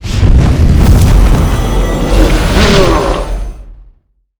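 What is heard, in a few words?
boss attack, monster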